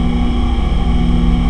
ENGINE

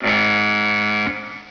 BUZZ

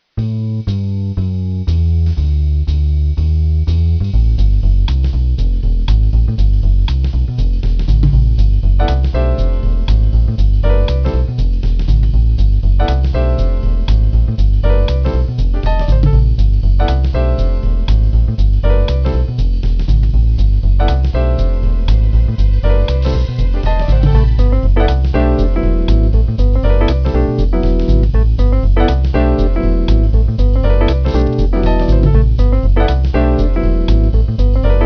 JAZZ

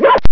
BARK

